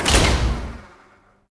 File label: elevator warehouse end; doors elevators; Star Wars Obi-Wan; XBOX